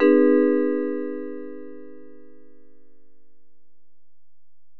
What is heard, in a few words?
Bell, Alesis DM-PRO